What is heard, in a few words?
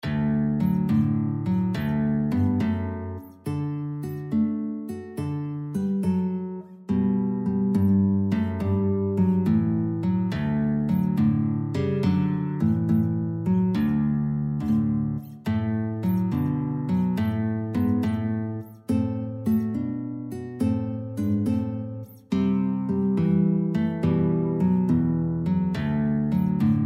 xmas, guitar; christ was born